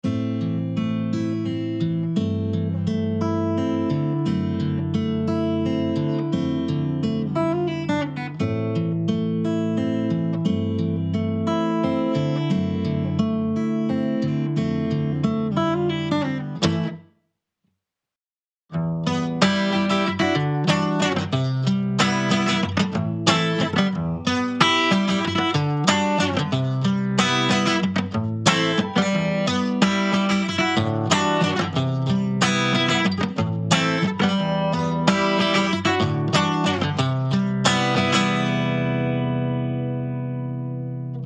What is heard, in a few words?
Martin D 28 new; James Tyler Variax; Après MàJ